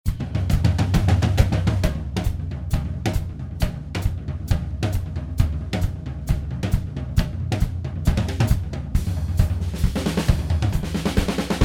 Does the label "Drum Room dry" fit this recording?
Yes